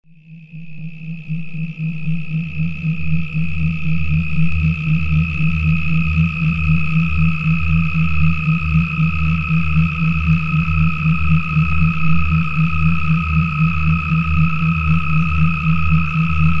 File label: CICADA MIX